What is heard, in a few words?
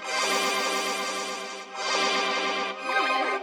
Shakey Synth